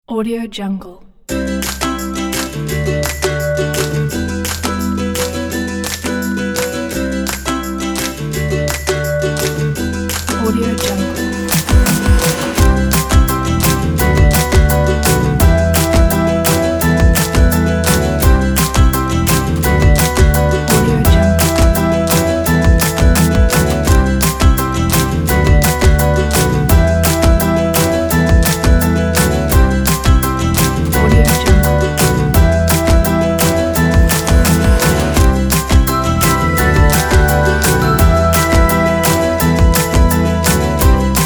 cheerful song

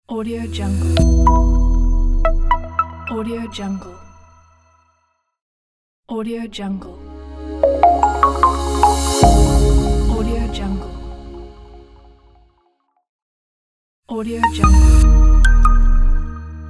minimal logo pack; idealsound

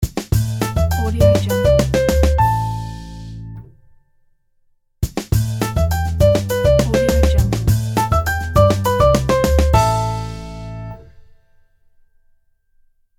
simple jazz logo

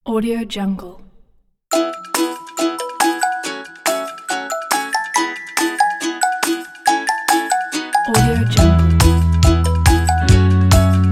acoustic motivate

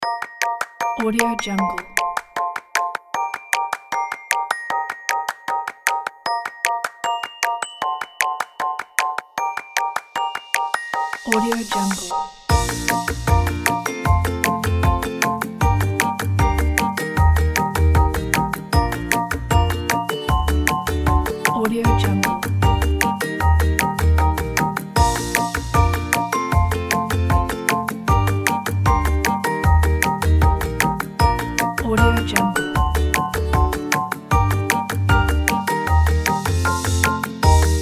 happy

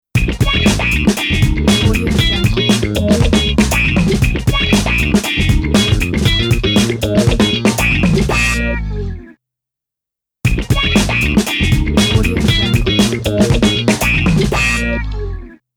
upbeat funk logo

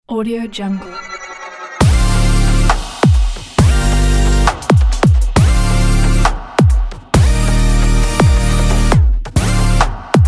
modern dubstep future; abcdmusic

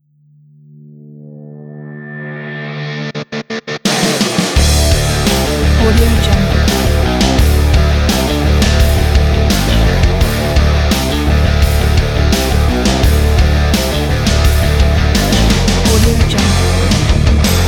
rock intro

